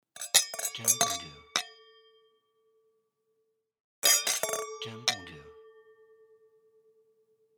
Clinking Glasses

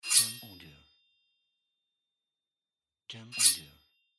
Sword Grinding

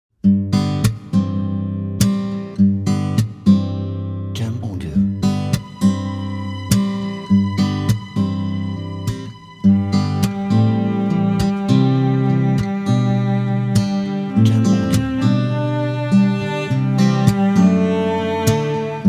Warm Guitar Romantic

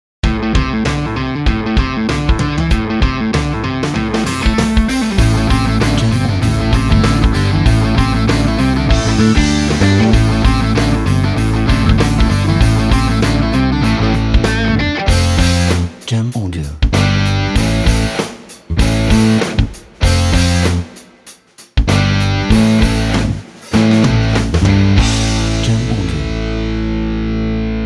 Sport Background

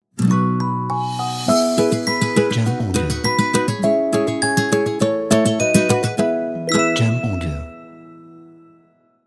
Folk Logo Ident Happy

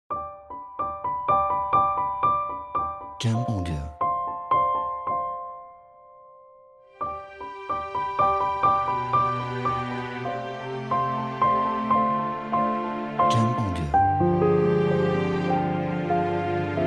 Classical Piano and Orchestra

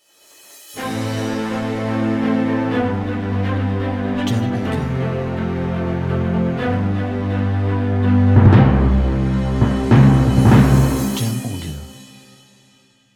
Epic Inspiring Logo

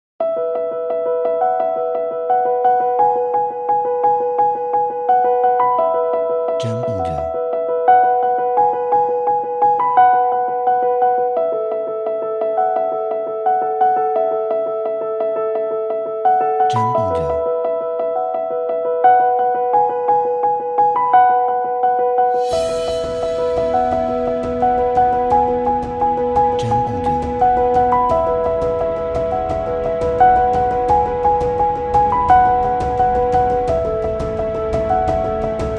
Piano and Orchestra Uplifting

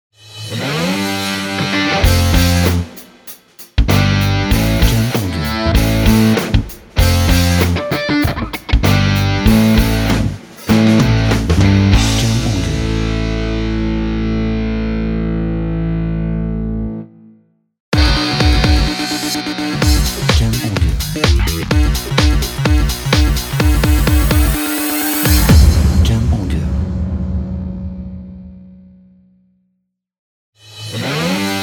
Powerful Logo Pack

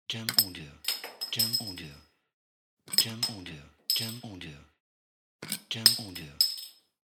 Beer Bottle Cap Open and Falling

